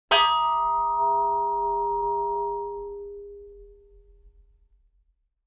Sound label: Brake Drum Hit Low; Vintage Cartoon; Drums & Percussion